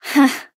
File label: Nonverbal Tease; Aurora